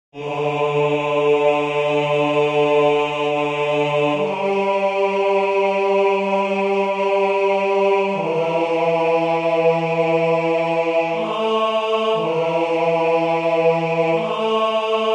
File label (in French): 4 verset 7 Bas; Bas 1; Monteverdi; Vespro; Baroque